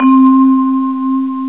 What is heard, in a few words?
Vib; Amiga 8-bit Sampled Voice